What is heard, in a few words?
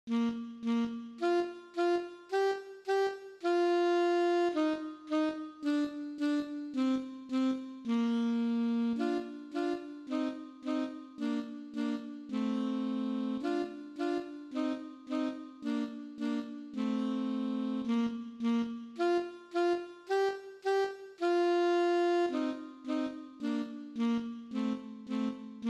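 Twinkle Twinkle, 2 Alto Saxophones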